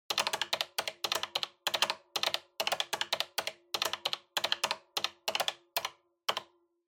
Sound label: Calculator (Pattern)